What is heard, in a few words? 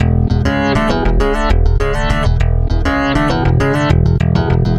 Stick Loop, Stick Bass